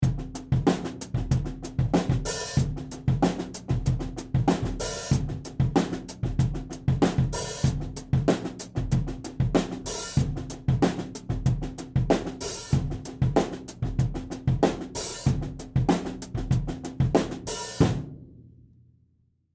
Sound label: ghost notes groove